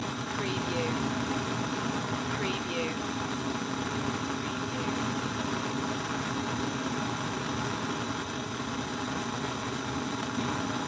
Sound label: Gas Flame Loop